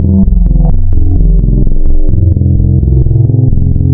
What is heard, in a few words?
Spectral, wavetables; Architecture 32